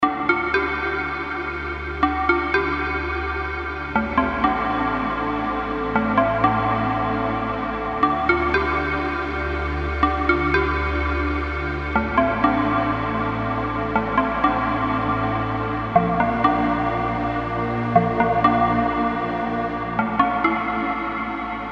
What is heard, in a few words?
Alley Grunge